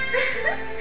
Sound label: giggle